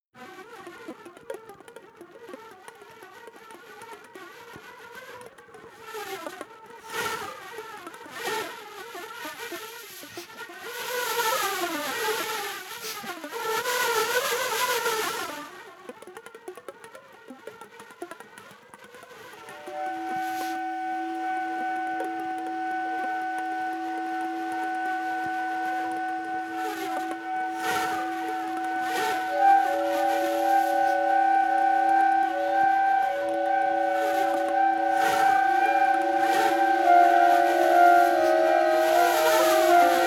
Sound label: TREE flute